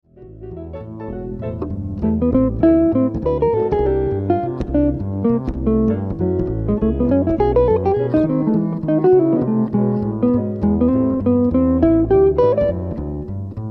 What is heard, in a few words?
guitar solo